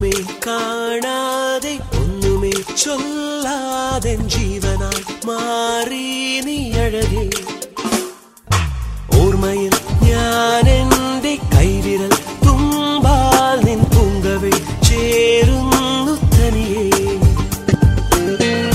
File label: malayalam romantic ringtone